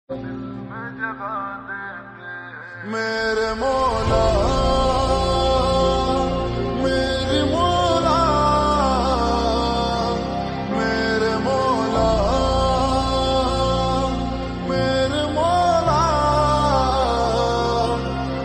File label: Slow Reverb Version Ringtone